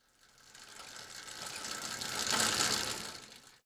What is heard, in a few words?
Bike By; Fairlight Sound Lib